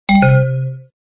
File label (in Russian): izvlechenie fleshki